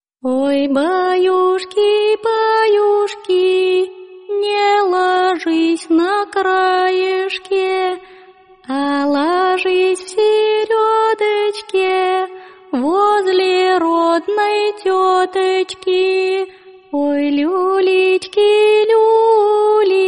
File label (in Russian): oj bayushki bayushki